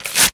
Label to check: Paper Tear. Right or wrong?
Right